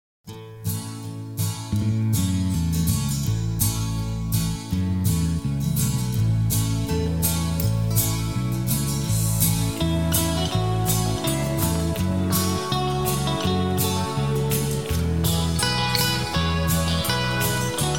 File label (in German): country traummelodien